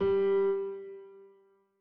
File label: Piano (G2)